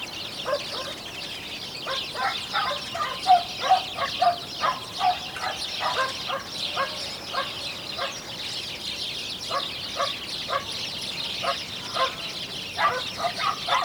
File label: Passer domesticus; SETE